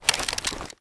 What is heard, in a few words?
deploy, ump45, weapons; csgo